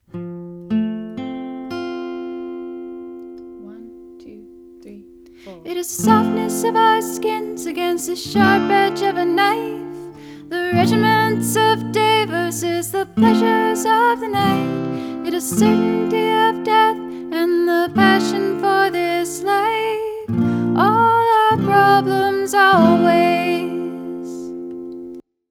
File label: verse harmonies